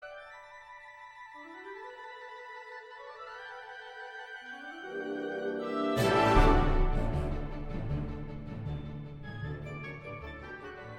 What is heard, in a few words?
Orchestral